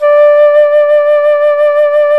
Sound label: FLUTE, Flt vib; Roland L-CDX-03 Disk 1